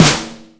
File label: snare organic